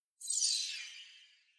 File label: Metal Shimmer Whoosh Sweep High Pitch; Sound Design Title Sfx; Tom Hutchings